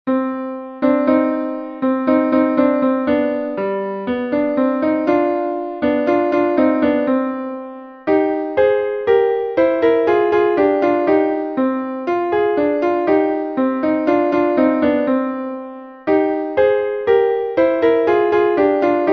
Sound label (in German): Klavier; Katjuscha